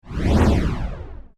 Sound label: Whoosh Flange; Production Element; Whooshes (Sound Effects:Sound Design)